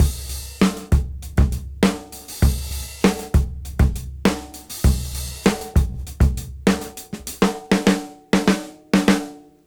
KL Beat 98 BPM; AMG - Keith LeBlanc Phat Butt Jams

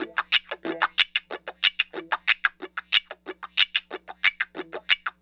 Call And Response Guitar, 70s Analog Funk

